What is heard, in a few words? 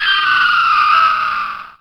gryphon die; wesnoth